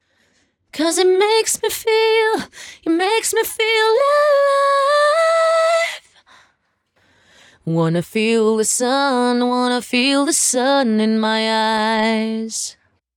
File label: sample wet100